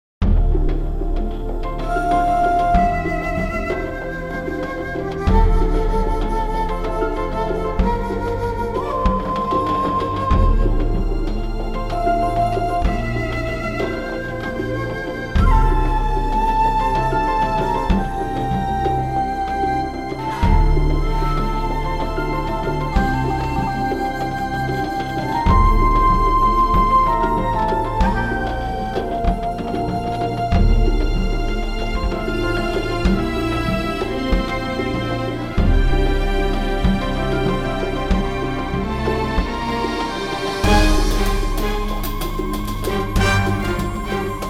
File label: Loop